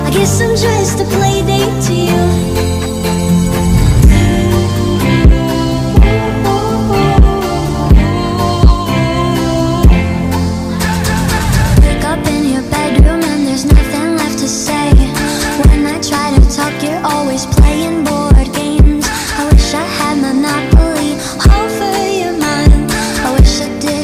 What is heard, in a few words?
BGM English Ringtone